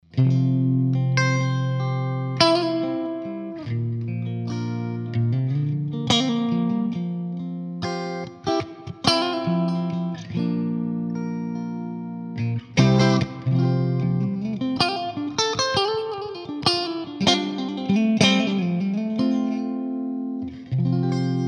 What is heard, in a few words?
jazzy